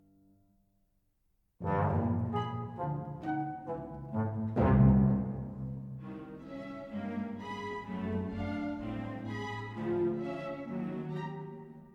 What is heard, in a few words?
partita in c burlesca